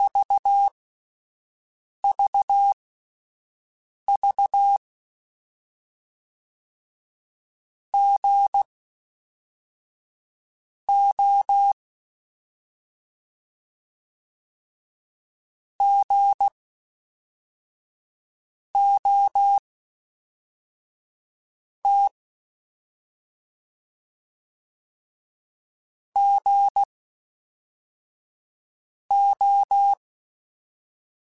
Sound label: Morse Code, CW Code Groups; Mixed